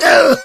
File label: smoker pain short; voice